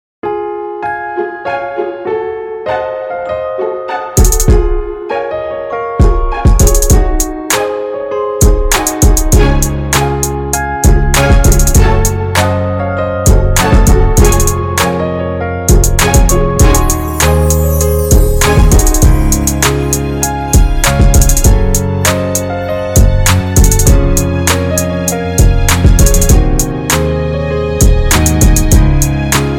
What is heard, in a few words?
Hip Hop